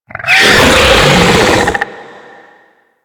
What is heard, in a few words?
Infested Ancient Attack, Enemies; Warframe